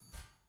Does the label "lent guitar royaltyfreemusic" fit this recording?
No